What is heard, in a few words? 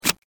SNIP